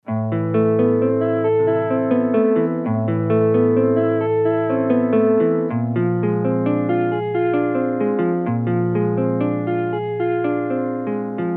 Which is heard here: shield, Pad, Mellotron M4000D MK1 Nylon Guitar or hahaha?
Mellotron M4000D MK1 Nylon Guitar